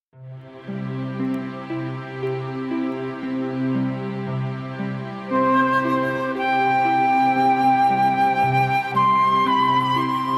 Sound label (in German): Floetenkonzert G-Dur Largo; Floetenkonzert G-Dur La Notte Op 10 Nr 2-Largo